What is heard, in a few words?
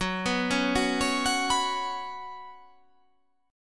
F sharp Suspended 4th